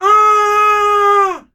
Grito

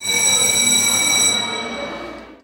Campana De La Escuela

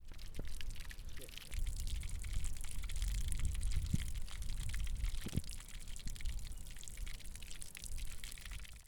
Agua Chorro

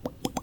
Ovejita Parpadeo

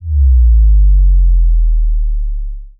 Bajo

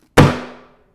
Libro Cayendo

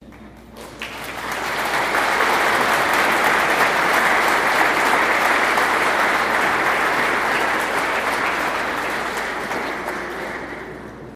Aplauso Iglesia

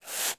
Smoke deslizandose por la tierra

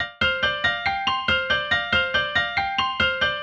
Pianos Y Teclados

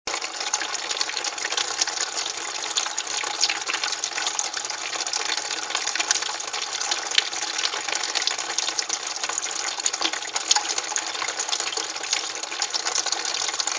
Agua Cayendo Del Grifo

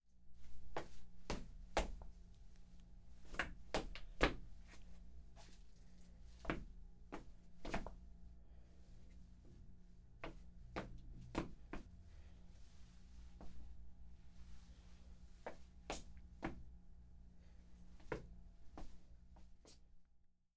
Escaleras

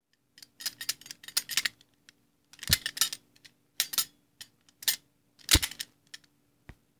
Armadura

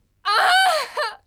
Grito Mujer

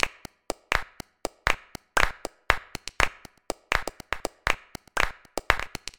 Palmas loop 120bpm

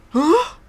Hombre asustado

